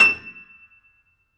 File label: FF E 6 off, Grand Piano off mic; AKAI S6000 CD-ROM - Volume 2